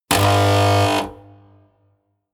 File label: buzz